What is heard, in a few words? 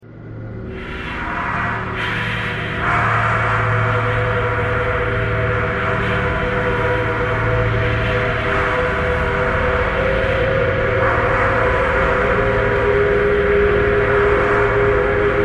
traffic core birds thread buzz